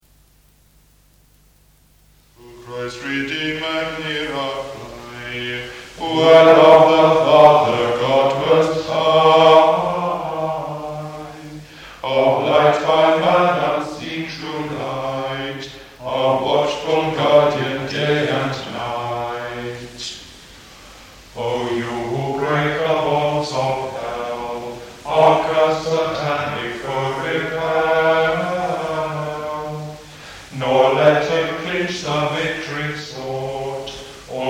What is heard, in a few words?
Monks singing at Night Prayer